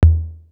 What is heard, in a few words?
Tom, Drum One Shots; Coldcut's Kleptomania VOL1